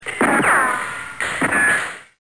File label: GUNFIGHT